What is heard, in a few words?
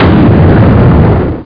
Cruiser Explosion; Missions of the Reliant!